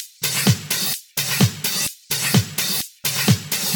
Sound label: House Loop, 128 BPM; Vengeance Samples